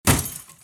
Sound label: glass crack; DECRYPTIONS INITIATED; TRACE MODE ENGAGED